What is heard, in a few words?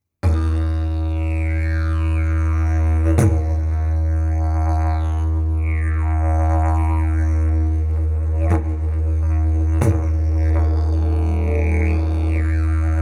didge; didgeridoo 691 key d e